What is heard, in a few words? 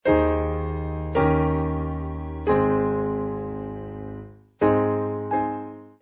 sample A Flat Major